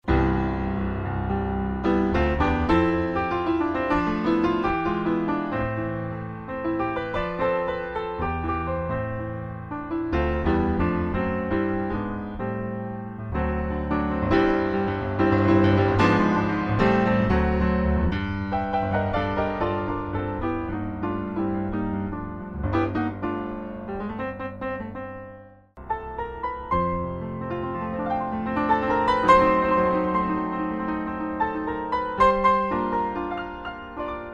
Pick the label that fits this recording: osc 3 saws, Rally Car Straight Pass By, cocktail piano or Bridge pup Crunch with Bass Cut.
cocktail piano